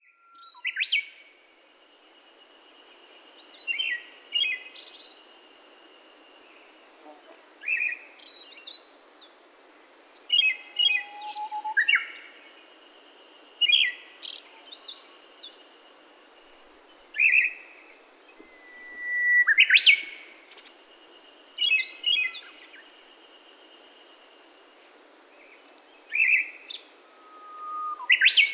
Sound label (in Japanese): アカハラ さえずり; yaita